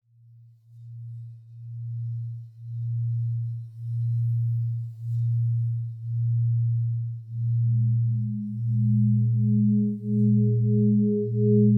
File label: Distortion Test